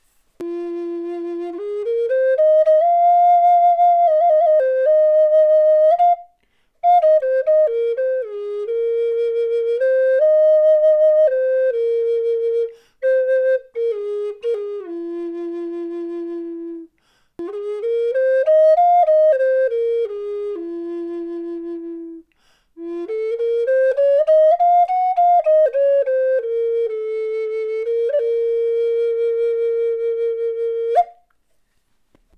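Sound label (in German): Hörprobe F Flöte Birke Nuss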